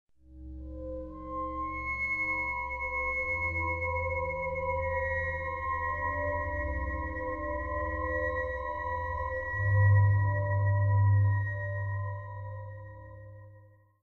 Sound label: stinger; Myst III Exile